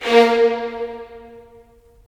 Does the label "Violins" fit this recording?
Yes